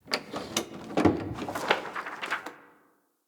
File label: Station Misc, Ambience; Soul Plane; Orlick